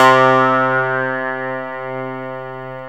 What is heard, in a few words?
reso clav, swing synths; Zero-G - Funky Elements